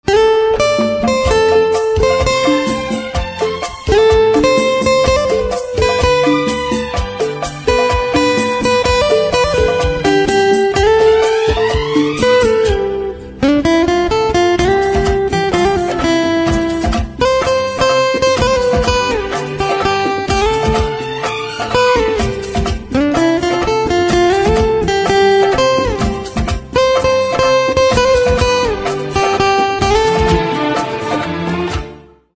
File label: Guitar; instrumental ringtones